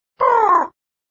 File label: sound discipline; Monster Arena